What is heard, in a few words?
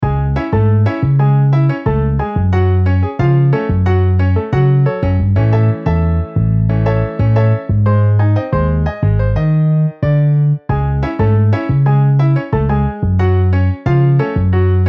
Piano Bass MIDI